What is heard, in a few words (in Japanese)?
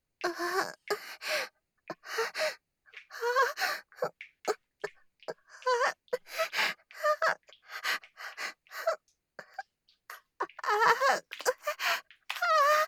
喘ぎ（SEあり）喘息